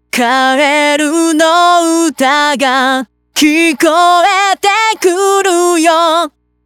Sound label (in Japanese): パワフル; synthesizer v